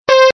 synthesizer

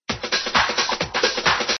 synthesizer

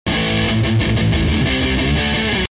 guitar

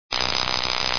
electric

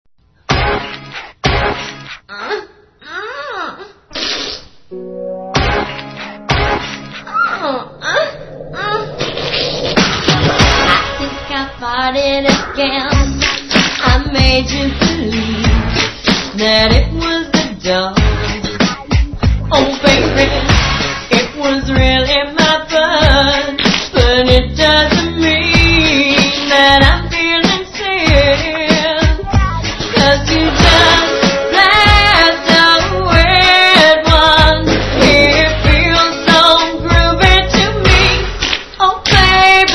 oops i farted again

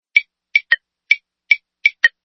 drums

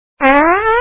fart

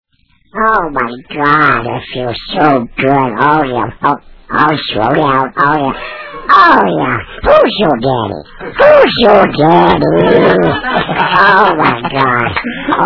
duck orgasm

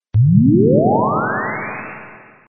synthesizer

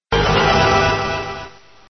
synthesizer

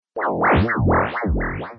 synthesizer

